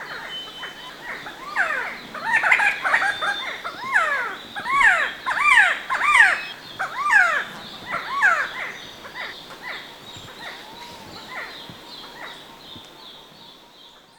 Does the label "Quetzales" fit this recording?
Yes